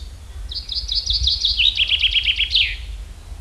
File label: call, chaffinch, full song. - SEX/AGE: adult male. - COMMENT: this bird sings two different phrases; Fringilla coelebs; ALTITUDE: +70 m.